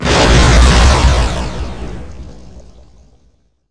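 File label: counter measures explode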